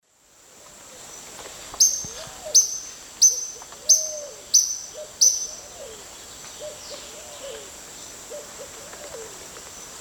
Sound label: Todirostrum maculatum, calls; S. America; Eastern Lowlands